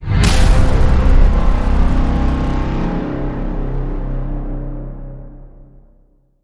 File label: hit tonal; Hit Tonal Bones Brigade